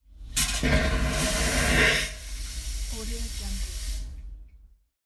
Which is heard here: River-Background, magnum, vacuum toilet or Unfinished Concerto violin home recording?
vacuum toilet